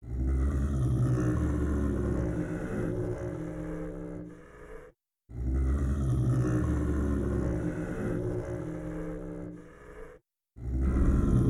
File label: disturbing ambience; F.E.A.R. 2: Project Origin